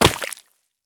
landing puddle; Escape from Tarkov

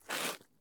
jump asphalt; Escape from Tarkov

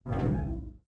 door double metal close start; Rust

